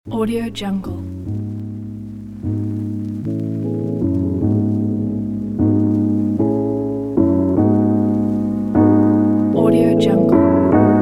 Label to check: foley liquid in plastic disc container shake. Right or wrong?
Wrong